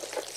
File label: snake, Footsteps